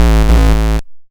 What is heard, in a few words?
YM Bass A, chip; CHIPSHOP HITS